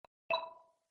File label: pausa